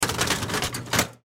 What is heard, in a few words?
Ice Tray In, Ice Tray Put In Freezer; Food; Household